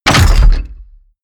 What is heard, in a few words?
slam, tueren